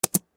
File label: type